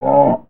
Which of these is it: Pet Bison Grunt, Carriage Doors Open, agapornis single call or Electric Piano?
Pet Bison Grunt